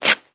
Velcro Tears Fast

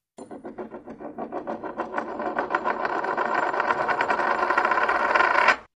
Coin Spin

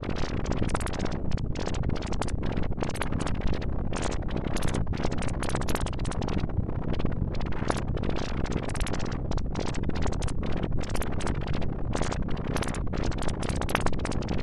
Mini Space Motor

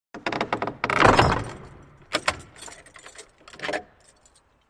Large Wooden Front Door; Close And Lock, Exterior

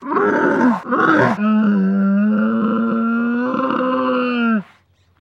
Camel Moan Trying To; camels

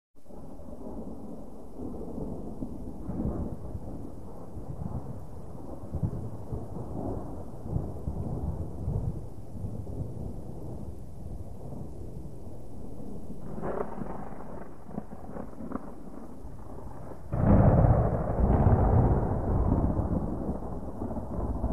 Thunder Gentle Ru, distant

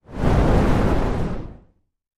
Fire Ball

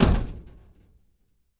Camaro Door Close Hea, chevy camaro

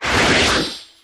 Blue Coil Shrt Burst, arcs & sparks

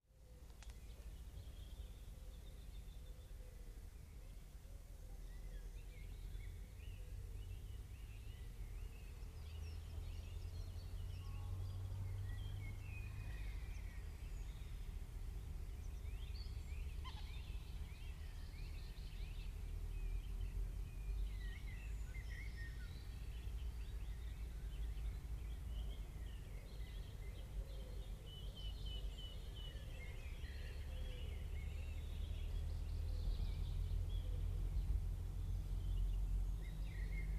Park Atmos Very Quiet

